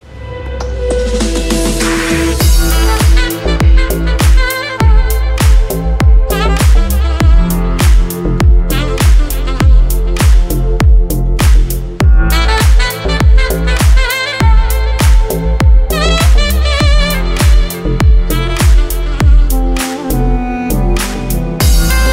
sax, mantra

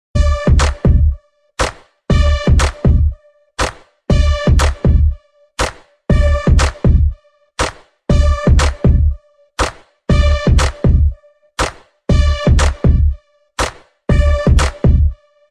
bass

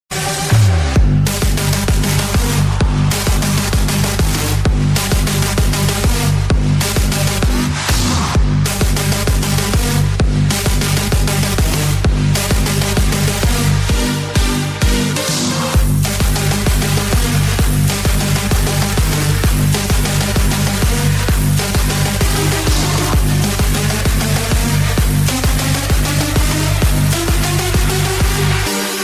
killer bass

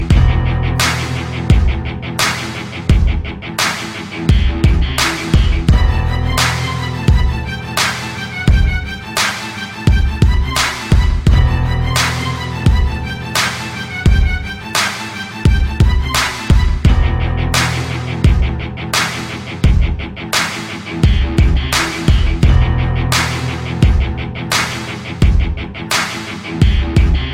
cool beat

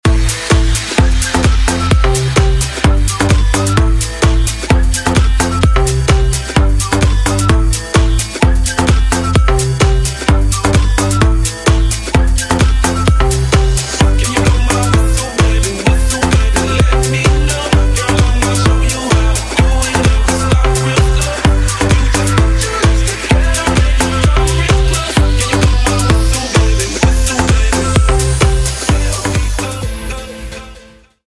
whistle remix

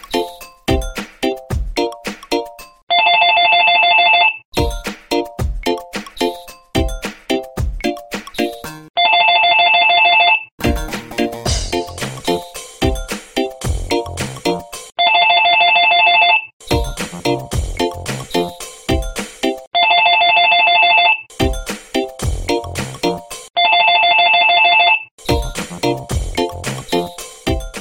smooth ring

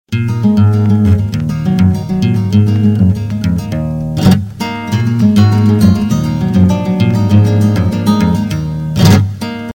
latino; ringtone

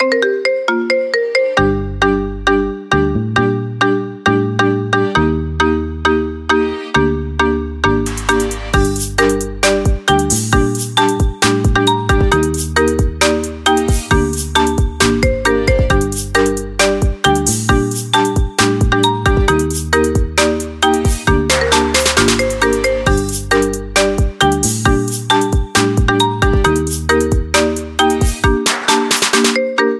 marimba remix; ringtone

